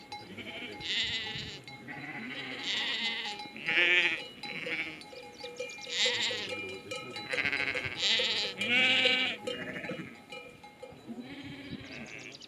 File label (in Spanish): Sonido de La Bandada